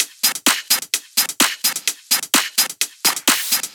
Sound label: Loop, 128 BPM